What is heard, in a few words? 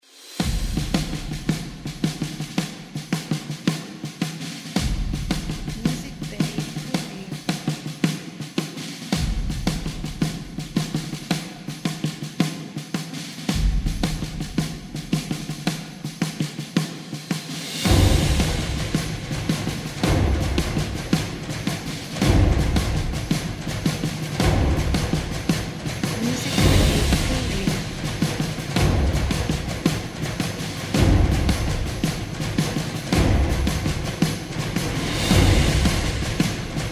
Military Epic Percussion